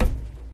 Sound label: plastic step; Golden Crayon Sounds